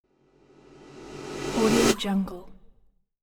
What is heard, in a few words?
cymbal whoosh approaching; hollywoodedge